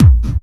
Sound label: hardcore 1, bassdrum